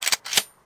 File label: reload; Zombies Night